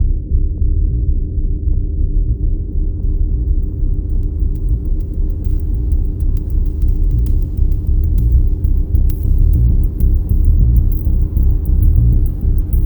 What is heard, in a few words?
Desert Ambient; Wrought Flesh